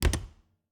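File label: Refrigerator, old fashioned, wooden, steel, brass clasps, hinges, close; Eiravaein Works; Vaeyan IV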